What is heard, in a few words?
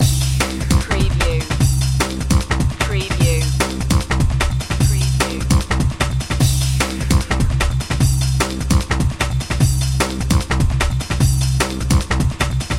aviation loop